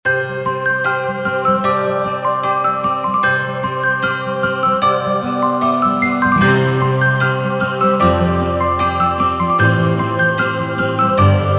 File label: jingle family